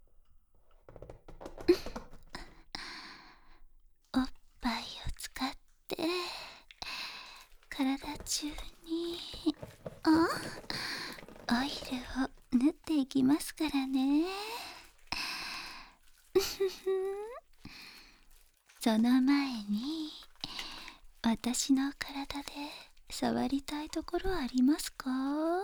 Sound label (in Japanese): 全身性感オイルマッサージ+耳舐め（マット音・効果音大きめ）, ASMR; フォーリーマット音; ぬるぬる人妻性感オイルマッサージ～おっとりドスケベ奥さんの秘密の行為～